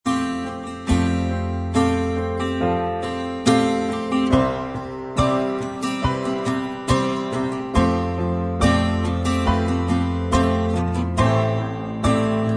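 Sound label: instrumental, backing tracks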